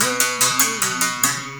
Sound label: BERIM GRV, BERIMBAU; Spectrasonics; Heart of Africa 2